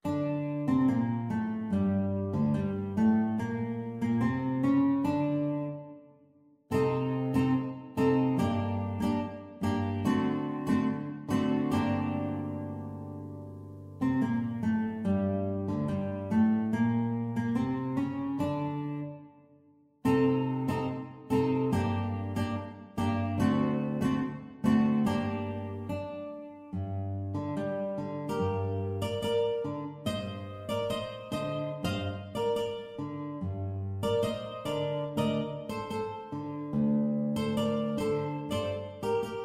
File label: tango, guitar